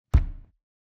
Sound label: Cleaned Layers